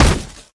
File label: step run